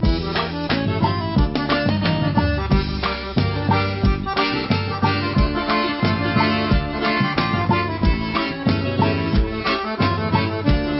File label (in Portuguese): acordeon